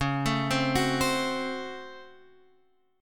C sharp Major 7th Suspended 4th Sharp 5th C sharp Guitar Open F; C#M7sus4#5; arpeggiated